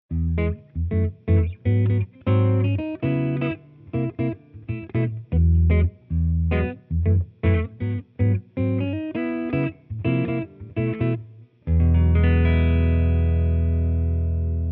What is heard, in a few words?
Les Paul jazz